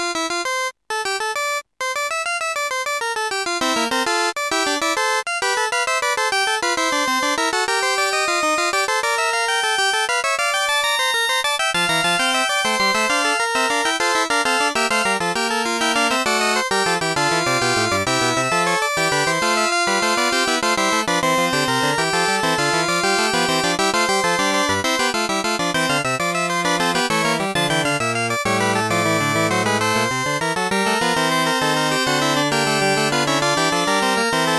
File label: fugue; Deluxe Music Construction Set